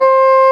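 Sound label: soft bassoon C5; Northstar - New Gold VOL-2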